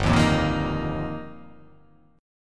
G Minor 7th Sharp 5th; Gm7#5; strummed; JGuitar

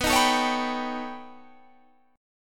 B Augmented 9th B, chord

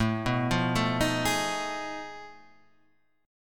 F 7th Sharp 9th Flat 5th A

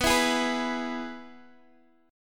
B 6th Suspended 4th B, guitar chord; B6sus4; strummed

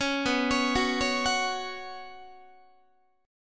B Suspended 2nd B, Guitar; Bsus2 arpeggiated; 4A,4E,5A,5D,5G#,6C#; 16,19,16,16,17,17; JGuitar

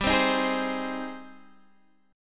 G sharp Major 7th G

